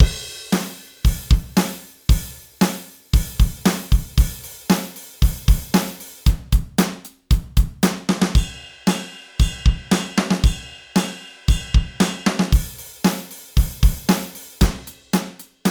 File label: Drums Wet